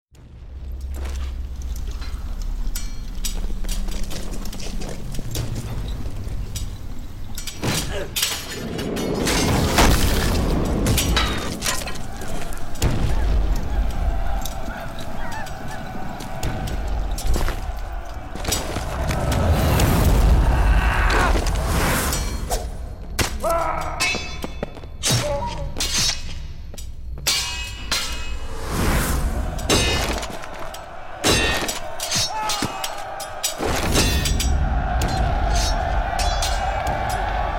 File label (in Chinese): 音乐音效 动画音效